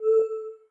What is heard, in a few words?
window open; Nokia; 770 Internet Tablet